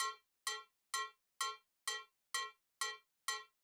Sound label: Drum Loop 128BPM Cowbell; VEC5; Drumloops; Multi Loops